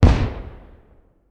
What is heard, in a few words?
firework bang